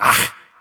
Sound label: vce counter